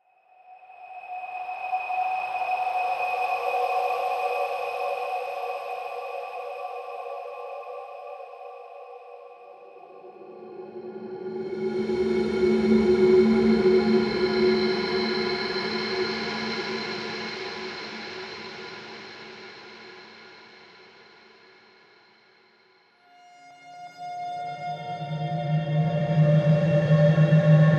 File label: Forest 82 BPM